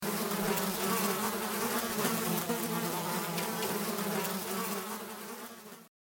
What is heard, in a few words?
flies